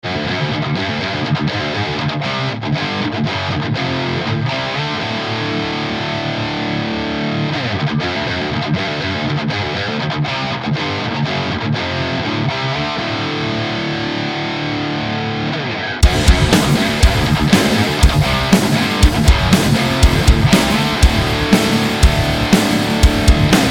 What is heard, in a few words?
jvm scooped rock, marshall